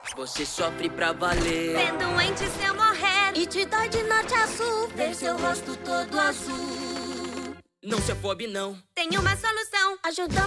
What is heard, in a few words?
Jingle; C.H.E.R.U.B